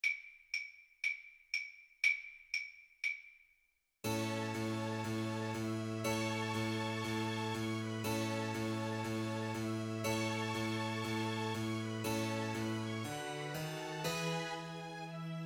spring, String Quartet

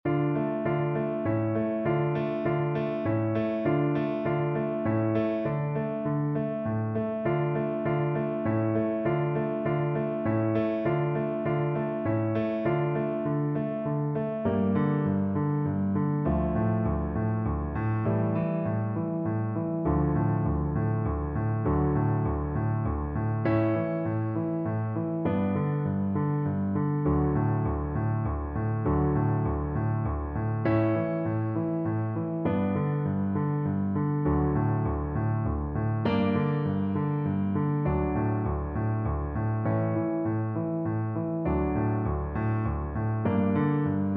a la nanita nana BN, Bassoon

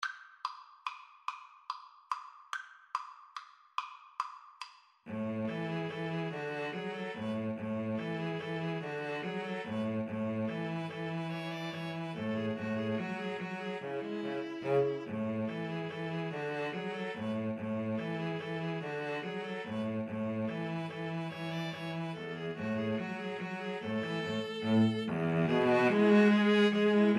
String trio

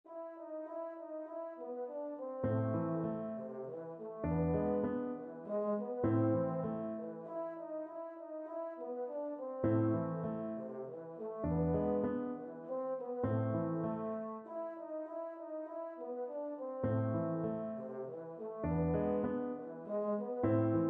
baritone, baritone horn

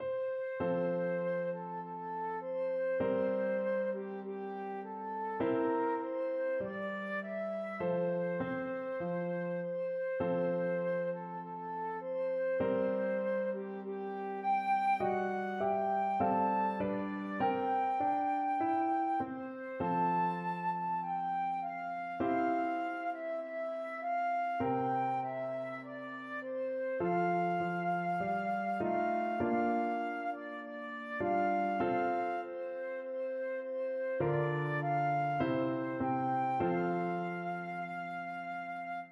america the beautiful flute

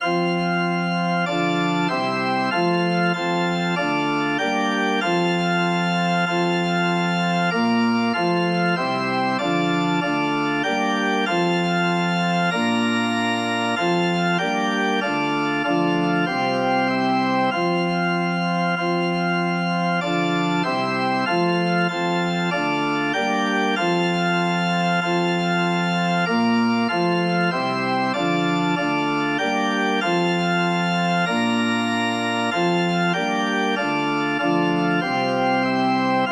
organ

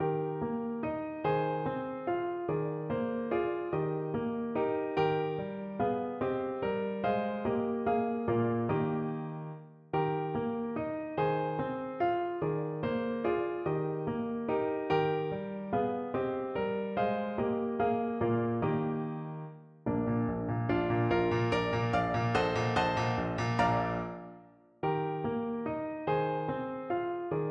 allemande, piano; weber; op4 no4

